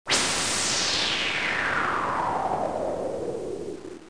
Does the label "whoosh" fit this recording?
Yes